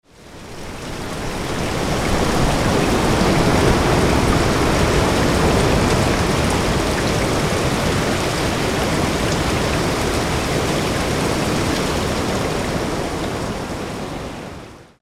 Green Noise; nature sounds ambient listening

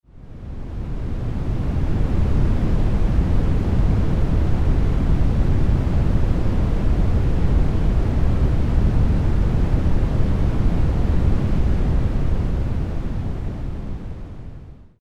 Red Noise; red noise ambience

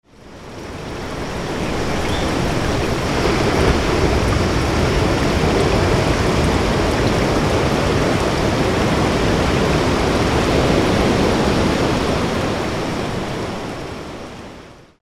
Green Noise; nature sounds ambient listening

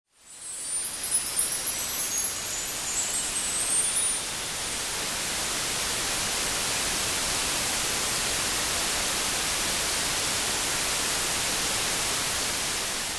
white noise windchimes

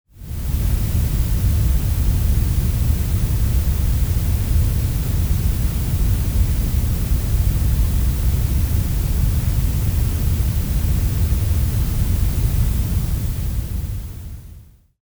grey noise

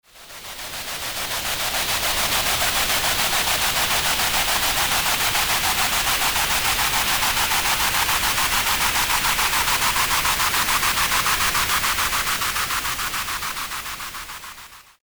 Tinnitus Scrubber; Tinnitus Scrubber 2.0

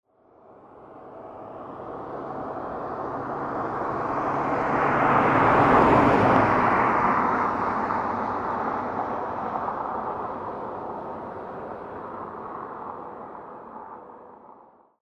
Lonely Highway, late night; lonely highway sounds late night

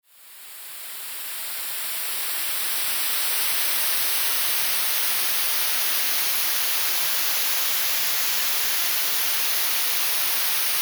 6000hz 8500hz notch sweep; tinnitus inverter

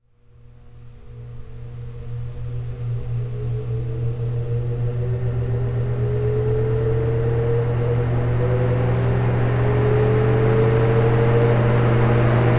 Fan Vintage; Sleep

